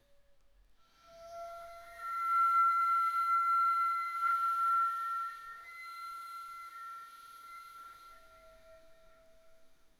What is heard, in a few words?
instrument